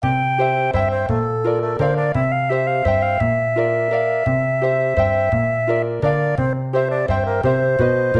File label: Waltz; Chocobo